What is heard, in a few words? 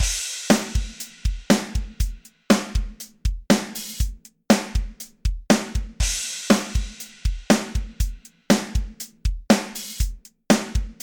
vocoder drum; XILS-Lab; Vocoder 5000